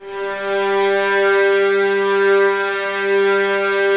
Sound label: VS G 3, violins, string ensembles; Miroslav Vitous; 11 Violins